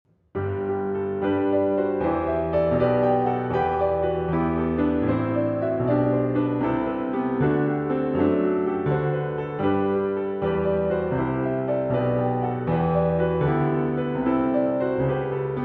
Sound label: Solo Piano; Cantata No. 147 Chorale - Jesu Joy